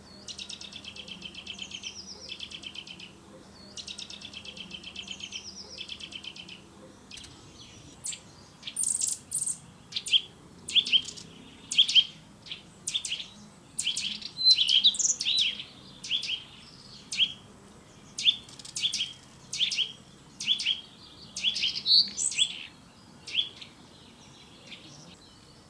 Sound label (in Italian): canto verso picchio muratore sitta europaea wood Nuthatch kleiber; Versi vari; bioacustica